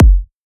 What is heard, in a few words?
Kick A#; Cymatics - Odyssey Kick 11